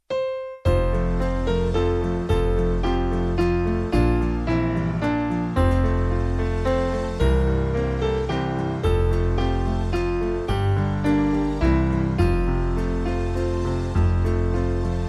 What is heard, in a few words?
Vocal Band; Away In A Manger; Mueller